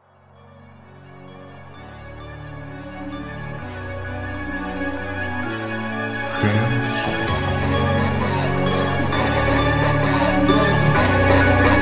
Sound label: phonk